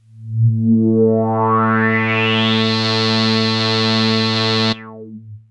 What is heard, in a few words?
Arp C A2, ARP Odyssey; synth; musicradar-synth-samples